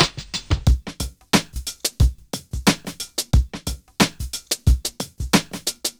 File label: Loop